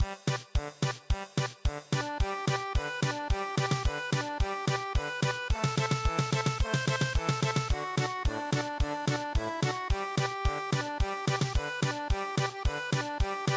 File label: German Polka; no rain